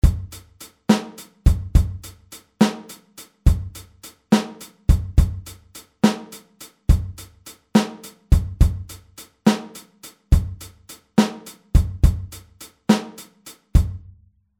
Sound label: Blues; Blues Boogie Rock n Roll; Grundstrukur in diversen Tempi